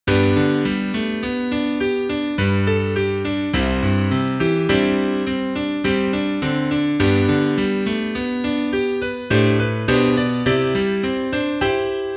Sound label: Esurientes implevit bonis Piano; capella; magnificat